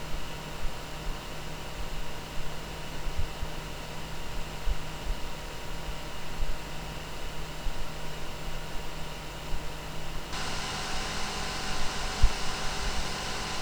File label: HD3850 Fan noise (idle,50,60,100)